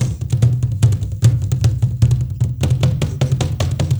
ZULUS, ZULU DRUM; Spectrasonics; Heart of Africa 2

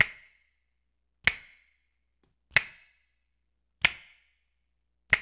HMM, 93; Spectrasonics - Supreme Beats - World Dance

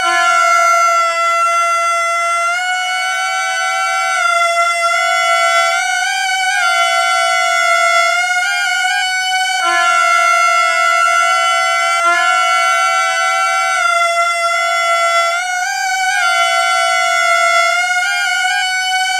Ghost Pipes; Sonic Foundry (Sony Creative Software); Loop Noir

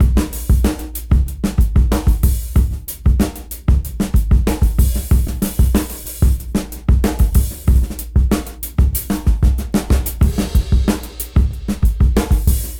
Busy Break 93 BPM

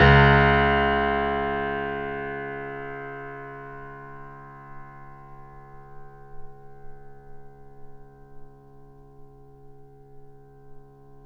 Mf C#1, Grand Piano off mic; AKAI S6000 CD-ROM - Volume 2

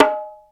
LOW BONGO; E-MU Formula 4000 Series Vol. 1; Hip Hop Nation

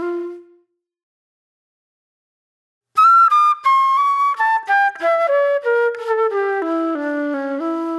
Reflective Flute

